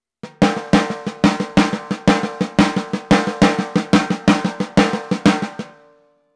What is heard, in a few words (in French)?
Caisse claire cuica